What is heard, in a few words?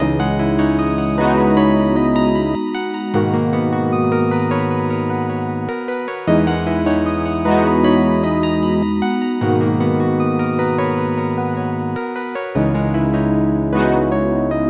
153 G m